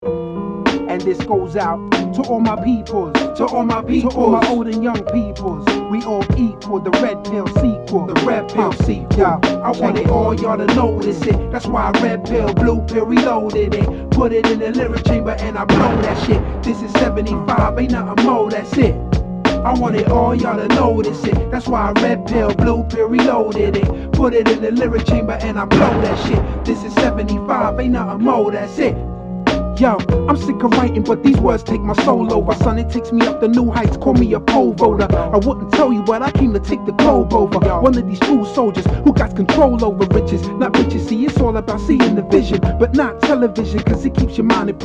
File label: funky